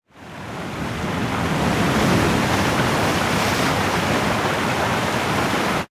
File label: damage hits explosions, Warships; World Of Warships